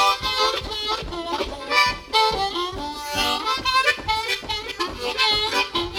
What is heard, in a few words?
HARMONICA